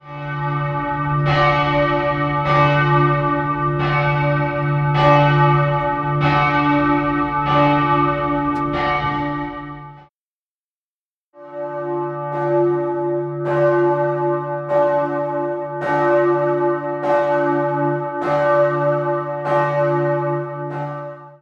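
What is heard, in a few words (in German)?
Münster in Freiburg d Glocke vorher nachher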